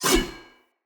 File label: Sword Whoosh; Weapons; Swords; Fenn Jotar Sword